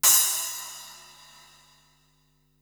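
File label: cymbal splash; 300 Drum Machines; Yamaha Motif ES-Series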